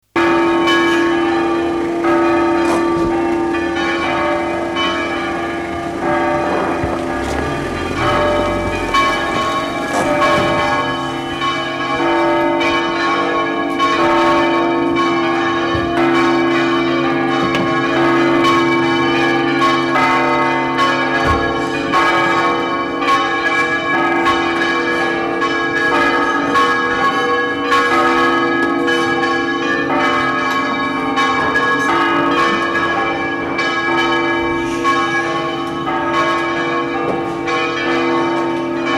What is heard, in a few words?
spain, carillon